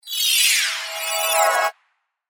Magical Whoosh, Musical, Production Element, Imaging Element, Accent, Transition; Whoosh Sound Effects; Blastwave